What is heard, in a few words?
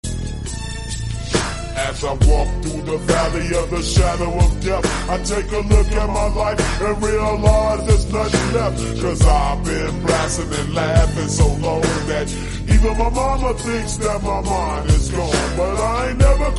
Slowed Plus Reverb Ringtone